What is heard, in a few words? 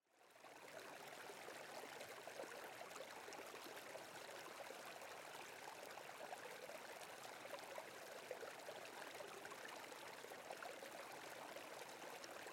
river